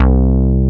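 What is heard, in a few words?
moog bass; ZERO-G; Datafile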